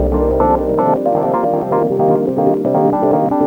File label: Sonic Burble; Jungle; Blu Mar Ten - Jungle Jungle - 1989 to 1999 Samplepack